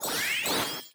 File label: p atk magspell